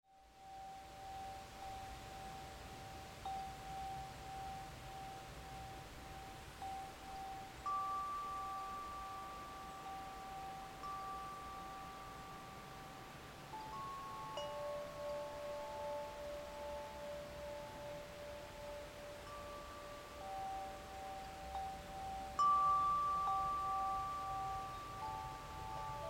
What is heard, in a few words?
wind chimes with wind and light rain